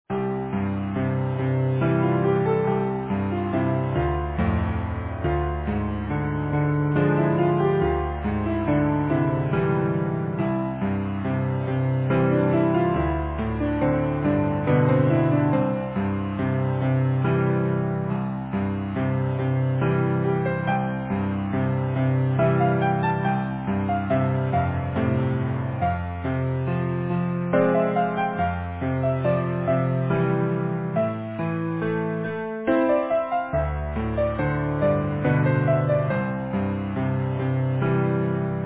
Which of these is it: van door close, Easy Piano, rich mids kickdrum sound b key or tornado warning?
Easy Piano